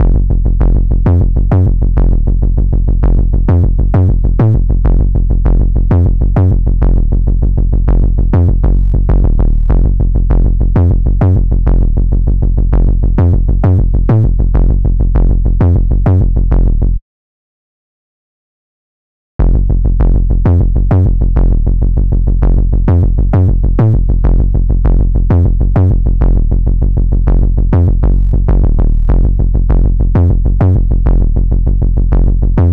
99 BPM F Min Sub Bass; Melody Loops; Vengeance; Cymatics; ANALOG EVOLUTION Beta; Cyber Collection

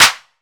Ice Clap, Dubstep; One-Shots; Drums; GhostHack; Ghosthack - AC2024 - Day 13 - Dubstep Sounds 2025

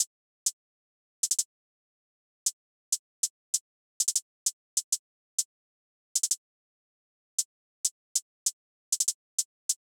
Drum Loop - 195 BPM Hihat; Loop Stems; Cymatics; Behind Drum Loop

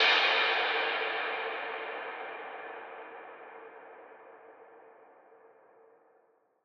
Impact; End Game; Cymatics; Trap Starter Pack

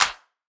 Clap, Hip Hop Drums - One Shots; Cymatics - 9God Hip Hop Sample Pack

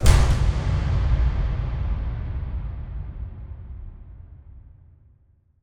Percussion Hit

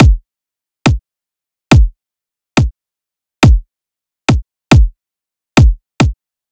Drum Loop 140 Kick; Loops; Ghosthack - Color Bass Essentials

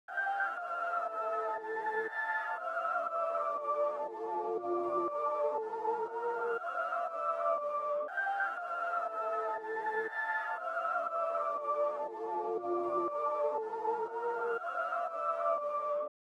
120 BPM D Min Reverse Pad; Trap; Loop Stems; Custom; Cymatics; 2022 Melody Collection; Trap Pt 1

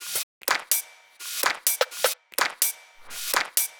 Acid Top Loop - 126 BPM, Drum Loops; House; Cymatics

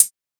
Closed Hihat Sprinkle; Drum One Shots

